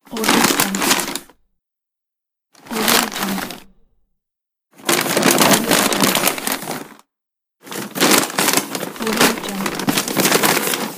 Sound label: paper bag